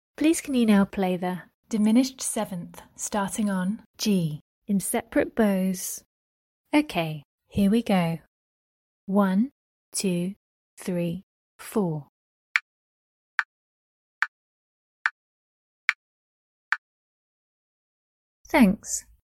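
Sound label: diminished 7th starting on G separate bows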